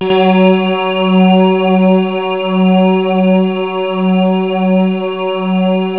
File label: dxpianosustain; labrat; jazz jackrabbit 2